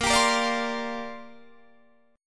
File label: A sharp 6th Add 9th, Guitar Open D